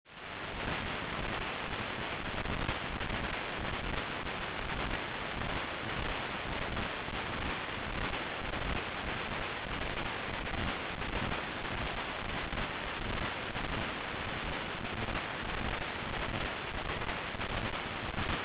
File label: noise; speaker recognition py